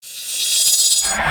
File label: Key Charge Up; Enemies; Warframe; Captain Vor